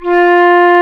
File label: flute, C flute